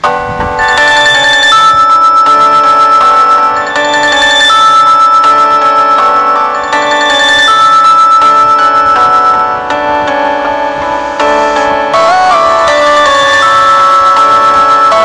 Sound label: Ringtone